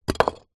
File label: foley brick drop on concrete block; zapsplat